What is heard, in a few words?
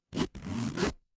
paper fabric zipper